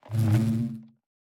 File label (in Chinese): 蜂箱 工作声